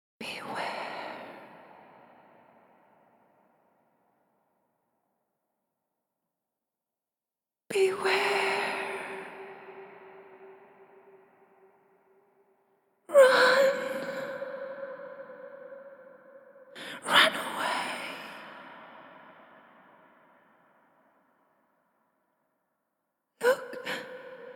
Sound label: ghostly female horror voice longing for peace